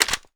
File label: famas mag out empty; weapons